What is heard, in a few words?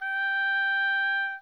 oboe mf G5